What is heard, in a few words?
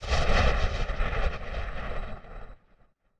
lightning sweetener; Sony Pictures Sound Effects Series; Thunder & Lightning